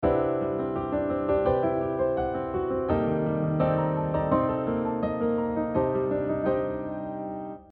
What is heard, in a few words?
parallel modulation